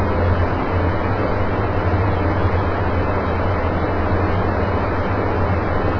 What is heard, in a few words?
metro vent